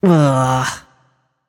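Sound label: ugh, female voice; smite; voicepack; hera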